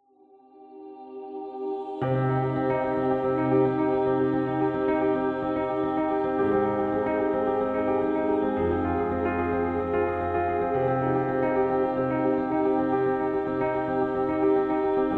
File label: Patriotic